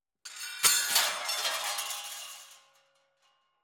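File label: metal drag slide fast in smash, interior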